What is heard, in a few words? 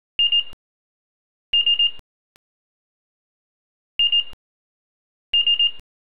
buzzer ans